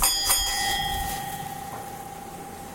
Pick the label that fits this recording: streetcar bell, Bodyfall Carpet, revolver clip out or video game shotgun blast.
streetcar bell